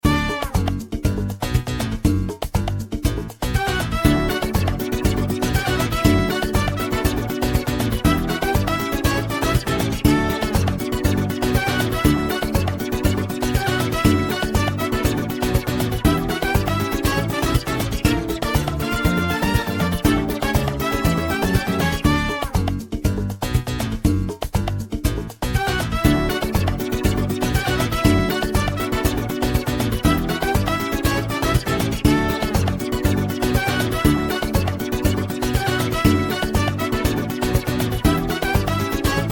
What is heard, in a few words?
Portal Radio